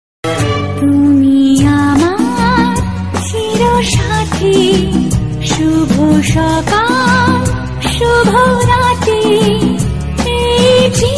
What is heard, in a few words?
Bengali Female Love Song Ringtone